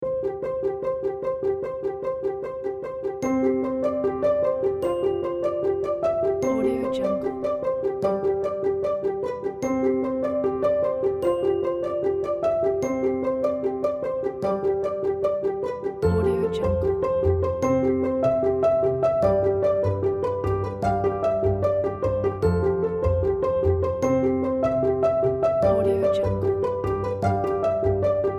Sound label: quirky business advert